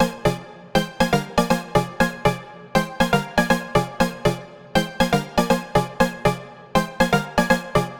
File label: Chord 120 F m